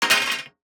DICE on Metal, Throw and Roll, Standard, Three Dice; Samples; ArticulatedSounds; DICE Sfx Party (Extended)